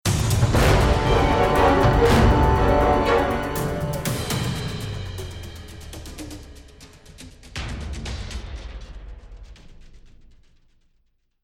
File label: lost round, music; valve; csgo